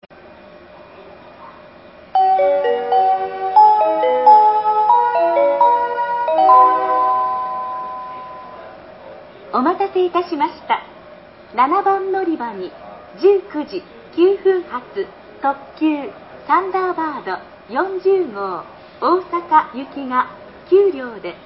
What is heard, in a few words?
kyoto track7 thunderbard40go; nishinihon